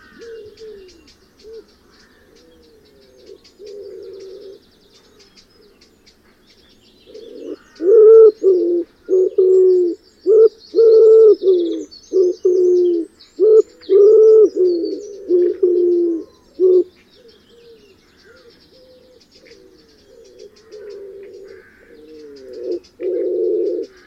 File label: wood pigeons